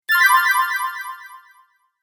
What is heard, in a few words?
Bright Fantasy Spell, magic; Magic Sound Effects